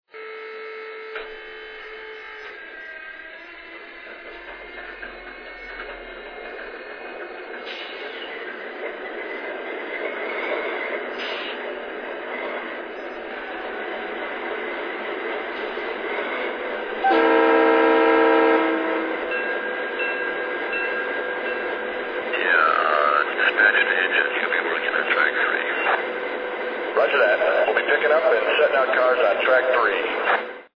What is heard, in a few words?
GE Dash 8